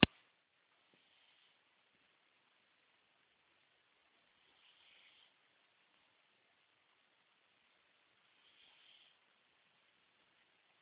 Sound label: sleeping cat